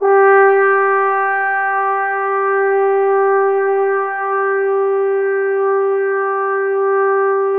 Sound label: Trombones, G4; Standard Instr